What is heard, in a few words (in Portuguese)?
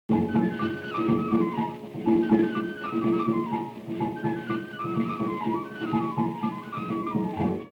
Flautas